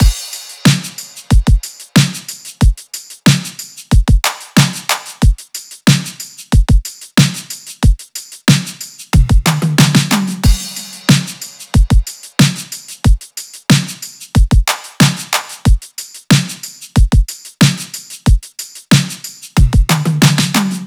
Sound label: drums 92, Drum Loops, Full Drums; Eagle; Slate; MIDNIGHT Sample Pack